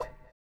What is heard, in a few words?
PERC; Spectrasonics; Metamorphosis; 3RD MAN